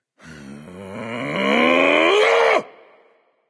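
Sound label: battle cry; smite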